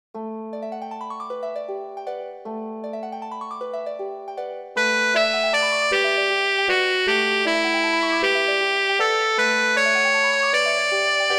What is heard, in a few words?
sop1, midi; britten ceremony